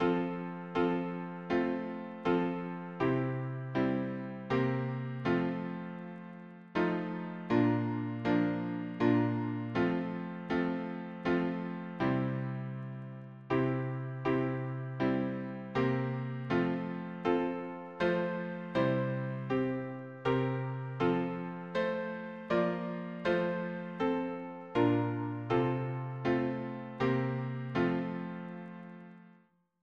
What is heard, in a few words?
harmony piano